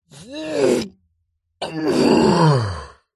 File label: human male middle aged strained vocal grunt groan; zapsplat